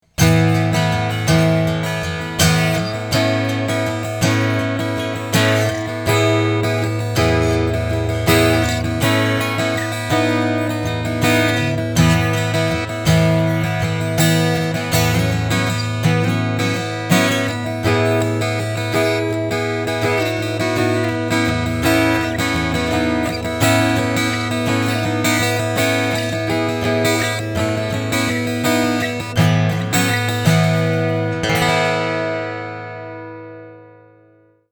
Strumming EQ 50

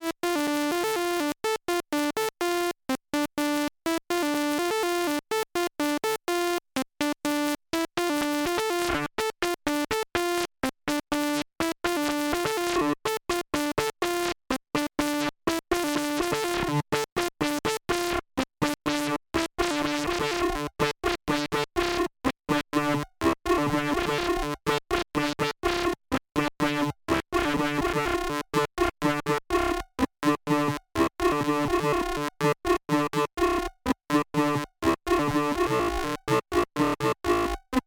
Linnaeus Different Filters Phase and Lin FM; Rossum Electro Music

